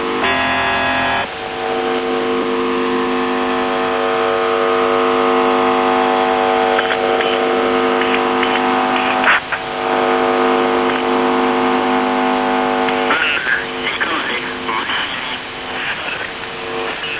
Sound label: buzzer usb msg